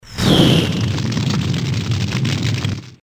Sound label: froosh fire; trogdor soundboard sfx